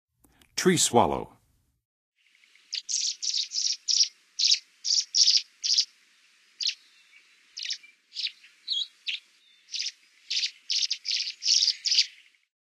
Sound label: Tree Swallow, Songs of Birds of North America; Western USA; Western USA Songbirds